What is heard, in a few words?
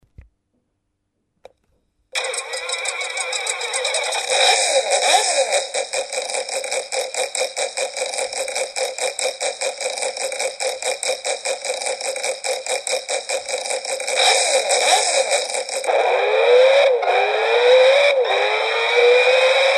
V8 straight cut